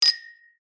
pub champagne flute cheers toast; field reports

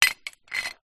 metal large pole loose parts movement ground; foley

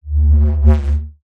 science fiction light sabre swing electronic hum

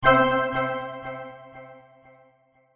game sound bright warm attention

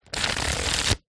household puzzle book thin pages flick through

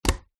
crutches on wooden floor single step; foley

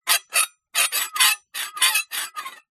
foley metal scrape squeak small

